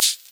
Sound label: PERC, Dance Mega Drums; Best Service; PERC MIX